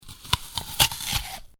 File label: Eating Bite Munch Crunch Person Bites Into Apple; human sound effects